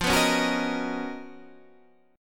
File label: C sharp 13th F